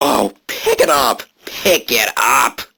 Barks Arcade Machine; Warframe; Mall

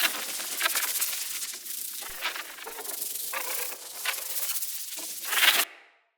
Corrupted Battle Dog Sub Boss Shield Loop; Combat

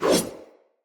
Dagger Whoosh Heavy, Swords; Weapons; Player; Josalfar

